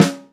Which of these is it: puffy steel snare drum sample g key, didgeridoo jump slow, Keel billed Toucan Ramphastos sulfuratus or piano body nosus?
puffy steel snare drum sample g key